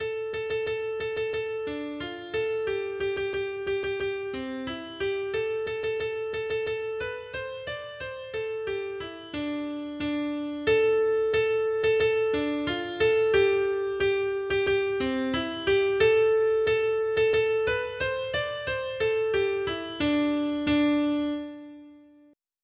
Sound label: piano ringtone; Drunken Sailor